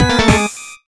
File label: BGM Heart Bad